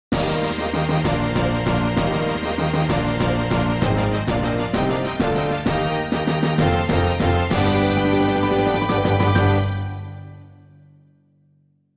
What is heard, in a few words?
Fanfare